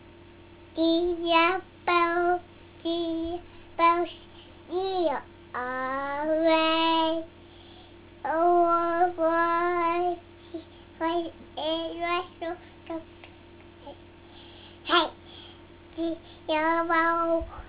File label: jingle bells